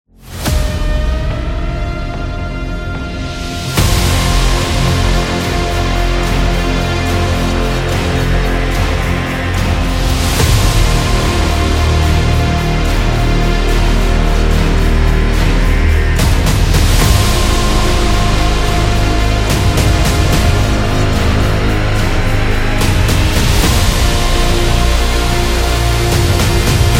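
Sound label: Army, epic cinematic trailer music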